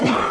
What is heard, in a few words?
JUMP